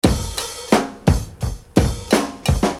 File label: Beat, Hip-Hop Street Beats